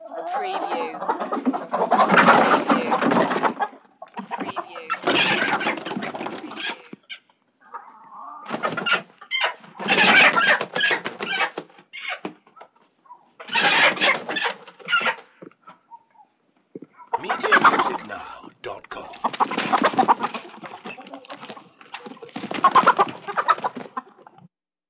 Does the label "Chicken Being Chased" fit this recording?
Yes